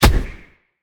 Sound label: hit arcane; smite; god merlin